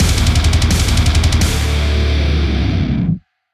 Level Up (Inst); Killing Floor 2

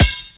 Basketball Bounce; Sports; Scratch

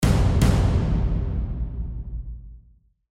action drum stinger

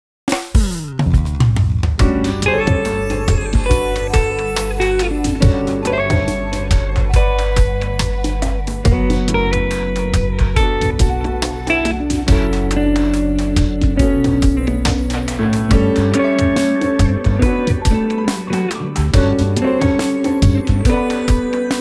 Smooth Jazz ver; Catherine: Full Body

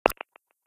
Modern Ui; Tank Stars Android gamerip 2018

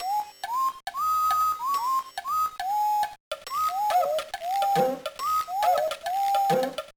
Bent Guitar

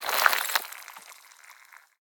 Ice Freeze Sweetener; Boomerang Fu

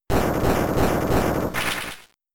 Rock Tomb; Pokemon; Gen 3; attack moves; RSE

